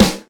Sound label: raw snare sample C sharp key; snare drum samples